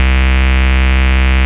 Ultimate Bass Root C; Vengeance Samples

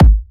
Soft Kicks; Vengeance Samples

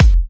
Kicks; Vengeance Samples

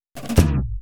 Fill 128 BPM; Loops; Vengeance Samples

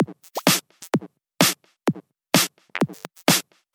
Housebeats, 128BPM; Vengeance Samples; VEH2 Loops

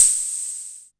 Ride, Cymbals; Vengeance Samples

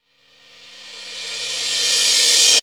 Reverse Cymbal; Vengeance Samples; VEH1 Cymbals

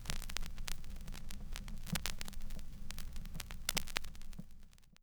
Record Start Short, Vinyl Record; Record Noises

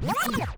back spin short, vinyl record

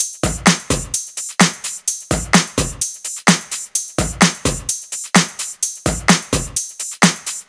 Entourage Drums 2, 128BPM; Vengeance Samples; Entourage Kit

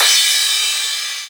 Crash, Cymbals; Vengeance Samples

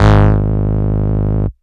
Bass, Root G#; Vengeance Samples

